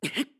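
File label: Hurt, voice; Link; BOTW; Breath of the Wild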